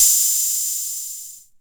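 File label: TR 606, drum kit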